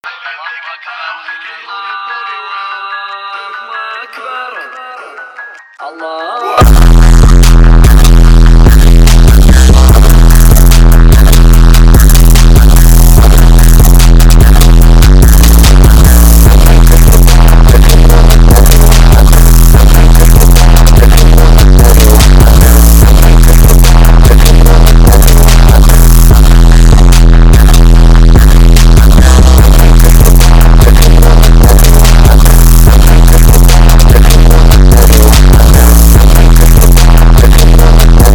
BASS

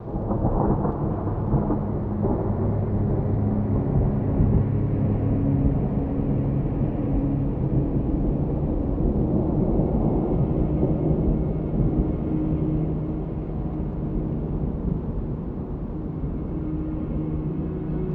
Rain Hard Nature Sounds - Forest Birds

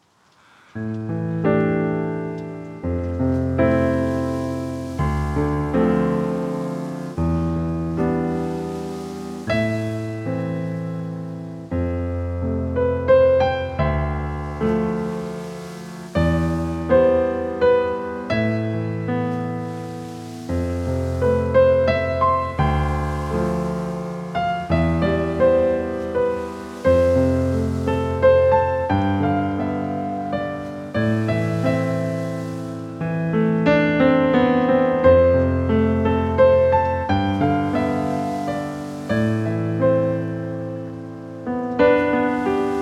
Quartet

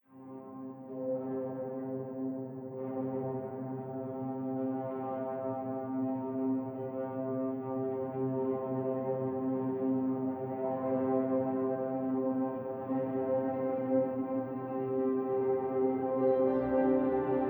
Delta Binaural 432 Hz

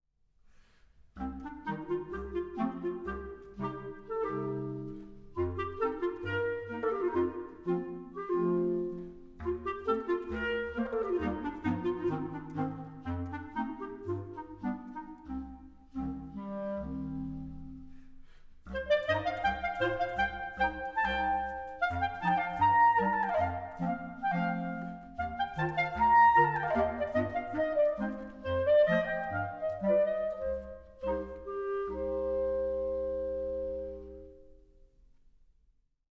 Clarinet Quartet, Romanian Folk Dances; Braul

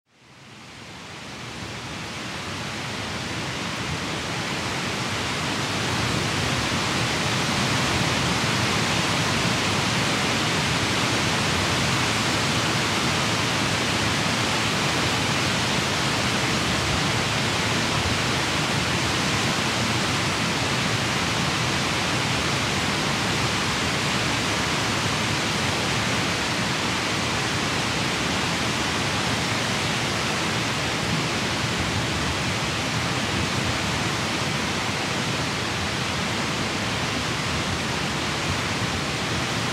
Broad Spectrum White Noise Constant Wind Sounds; Natural Noiz Machine

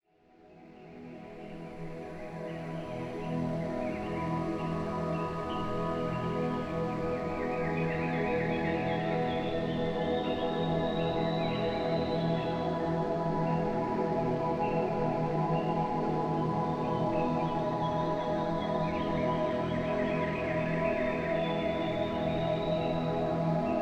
Binaural Beats Sleep, Study Music Asmr Birds

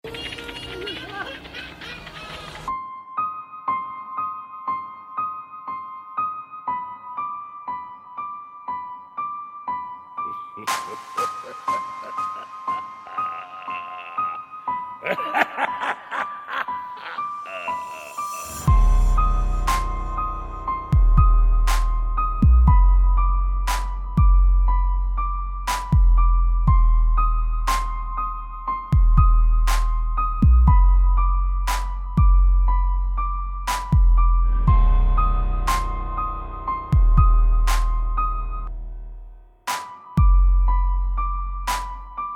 trap rep

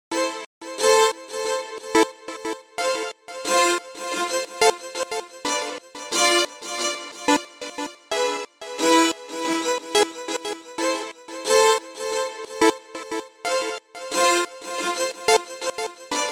Beat; Beat Battle 3 round 3

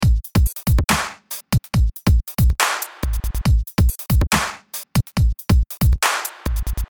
Latin Dubstep

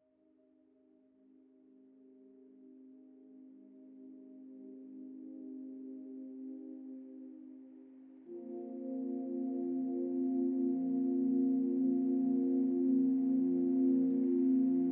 Binaural Beats Brain Waves Isochronic Tones

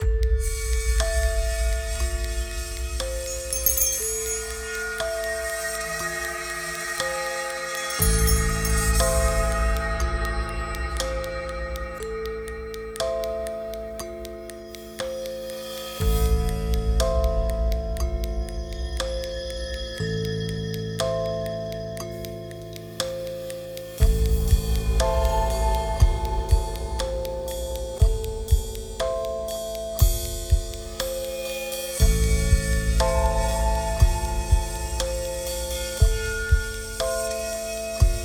Kalimba

